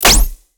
abstract guns; sonniss gdc

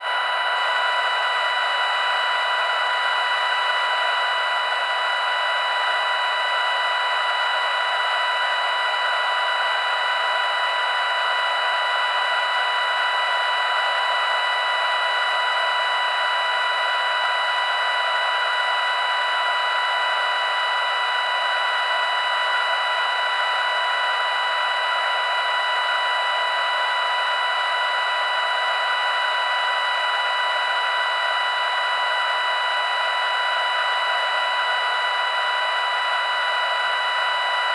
cb radio freq static

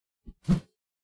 melee attack swoosh heavy, weapon swooshes; psfx

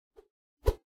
meleeattack swoosh light, weapon swooshes; psfx

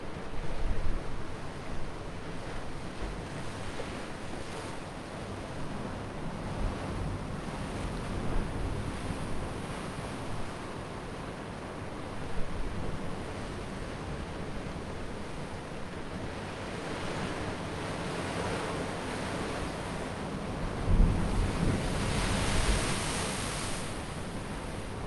ocean waves kauai night waves; fvtt sonniss gdc audio

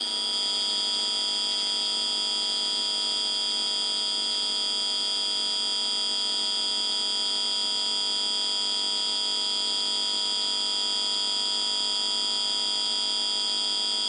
lamps designed 40 watt; fvtt sonniss gdc audio

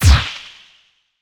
Melee; lancer weapon fx

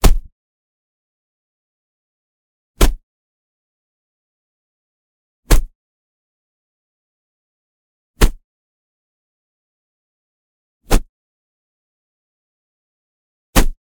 flog leather hit smack; Sonniss GDC

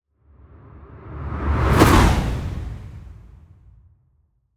transition whoosh big subtle tonal; sonniss gdc

